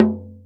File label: Toms, Real